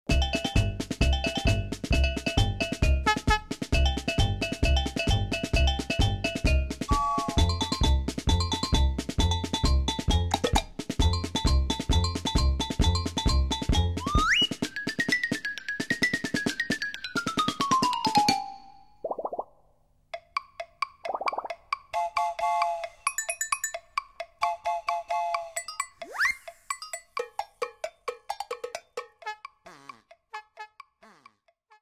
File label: percussion; penguin circus